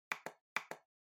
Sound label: Trackpad Click Far L Double